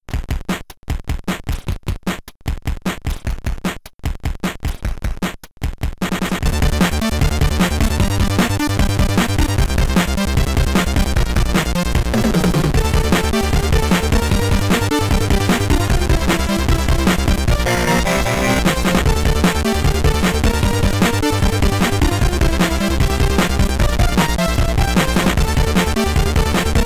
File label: SID Stereo; Grand Prix Circuit; Commodore 64